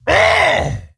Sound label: Wound Crit; Kologarn